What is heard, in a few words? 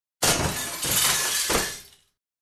Glass shattering stereo